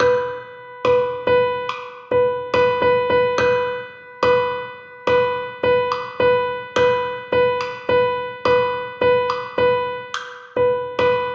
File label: Rhythm Exercise